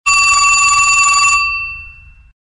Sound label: Vintage Telephone; LG A290